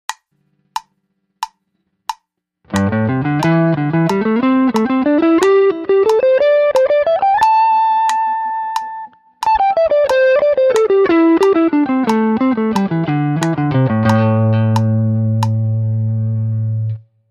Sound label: Penta 90bpm